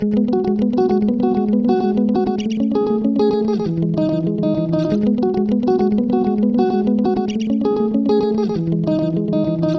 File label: guitar 112ms echo center